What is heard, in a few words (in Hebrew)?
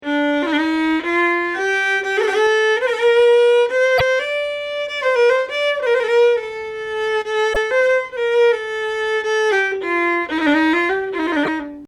חוסייני כינור, מאקם ומוזיקה ערבית